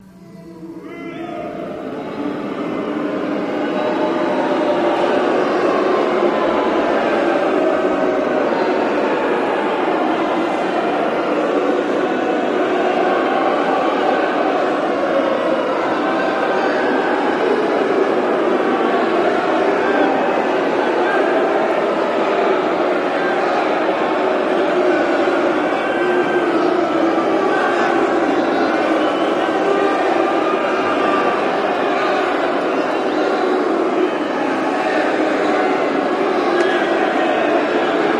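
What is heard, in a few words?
Crowd Boos Interior Gym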